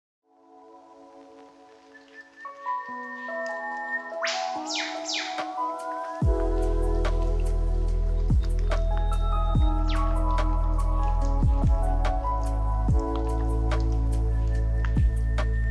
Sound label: Whip bird with music